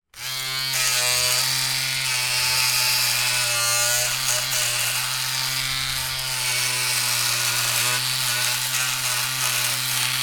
household electric razor operate trimming thick stuble